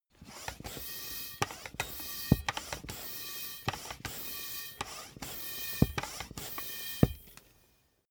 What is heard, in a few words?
soccer ball inflate sequence